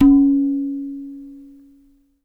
TOOM, NYC Percussionworks; Sampleheads; 90 s Sample CDs

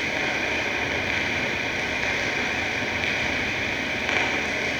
Cracklature, sparse soundscape

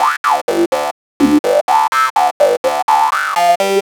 Rambling Seqs, Synths; Sonic Foundry (Sony Creative Software); Underground UK House & Electro

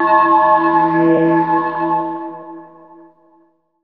DR. LOOP; X-Static Goldmine 3

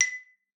B5, xylophone; Xsample VOL-4

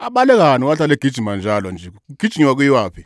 Vocal Chirp, African Voices

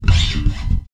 slides, bass; Spectrasonics; Bass Legends CD 1

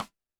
Snare, Roland TD-20

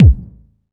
Kick, retro house samples, Drum Hits

